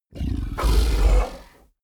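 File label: fishman snort breath, creatures; kreaturen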